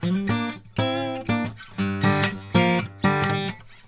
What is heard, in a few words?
Blues Guitar; ILIO; Fretworks; LICK